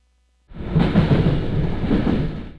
point, train; OpenBVE

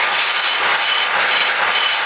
Flange, Train; Siemens ES64U2

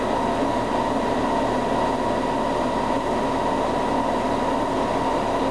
comp loop, Class 47; OpenBVE; Train

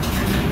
air zero, R142; OpenBVE; Jerome Yard

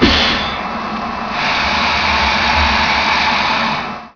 Air High, Train; Siemens ES64U2 12 Car Push

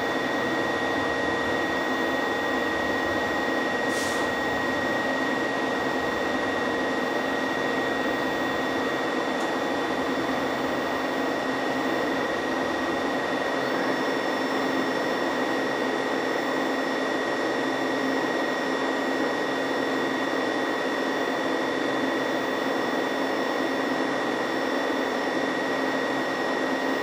CP loop, Train, R62 Kawasaki; OpenBVE

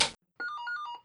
radio, Train EN57; EN57-815; OpenBVE

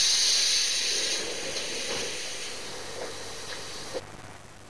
Door Open, Train; OpenBVE

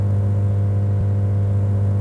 Motor, Train; OpenBVE

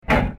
Wiper; train; OpenBVE

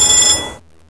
tpws self test, Train; Y8420; OpenBVE; vieux wagons

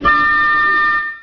klaxon, train; OpenBVE

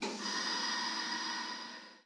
Air High, Train; OpenBVE

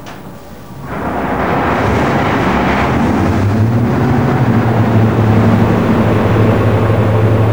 eng start, Train; OpenBVE; 55022 Royal Scots Grey

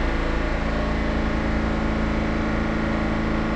cp loop, train; R110B; OpenBVE

